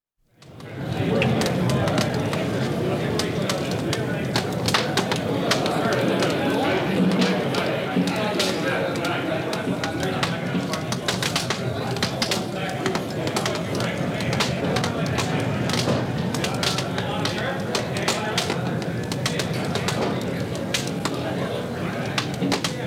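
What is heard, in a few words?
Fire Campfire Large Men Talking In Background; Electa Quinney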